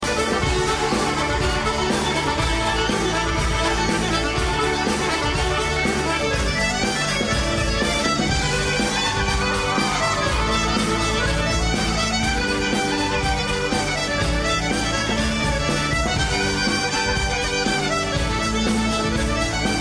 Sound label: Violin Duet